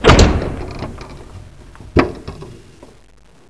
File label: squeek stop